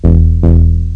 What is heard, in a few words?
ERROR